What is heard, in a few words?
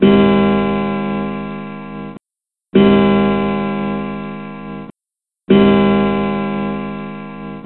Grandfather clock